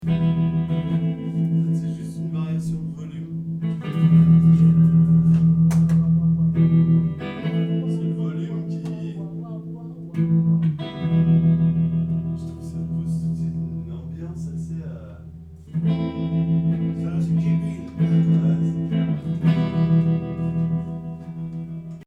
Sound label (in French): tremolo guitare